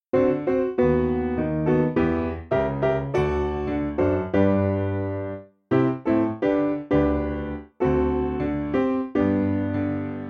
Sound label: Piano